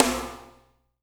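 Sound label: snare, right; Spectrasonics; Burning Grooves CD2; HIT ME KIT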